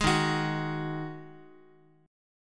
D sharp Minor Double Flat 5th D sharp, down, Guitar; strummed; JGuitar